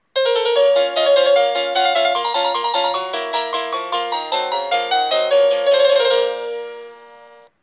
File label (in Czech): melodie